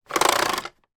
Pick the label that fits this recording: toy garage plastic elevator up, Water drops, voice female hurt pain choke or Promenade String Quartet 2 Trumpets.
toy garage plastic elevator up